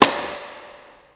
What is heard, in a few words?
ball charge